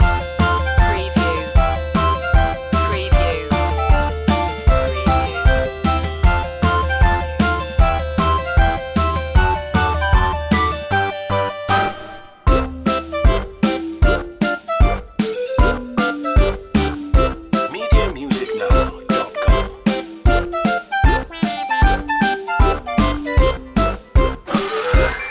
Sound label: retro video game chicken run loop